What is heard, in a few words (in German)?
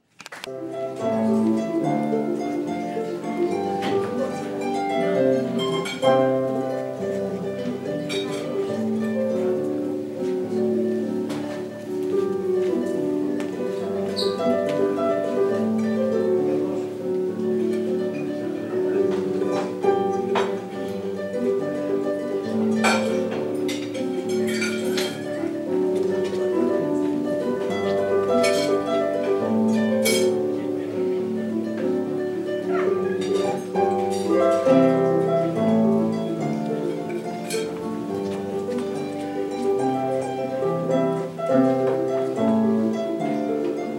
Harfe; Worgl